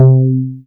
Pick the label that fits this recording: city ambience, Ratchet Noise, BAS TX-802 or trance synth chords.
BAS TX-802